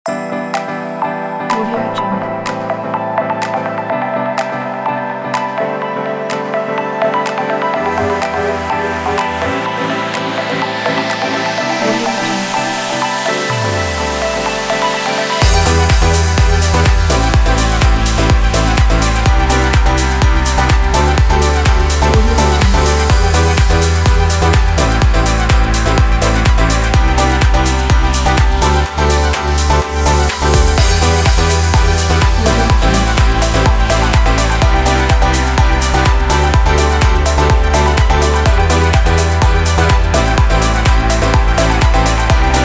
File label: energetic uplifting dance